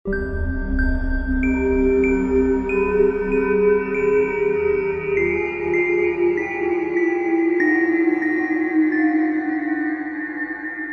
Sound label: horror ghostly musical riff twinkle little star evil